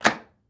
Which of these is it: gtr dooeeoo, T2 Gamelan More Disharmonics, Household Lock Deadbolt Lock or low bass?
Household Lock Deadbolt Lock